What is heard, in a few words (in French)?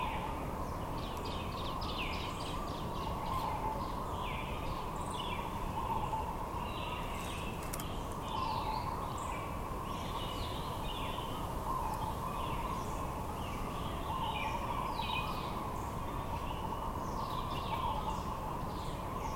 Ambiance Bras d'eau Ile Maurice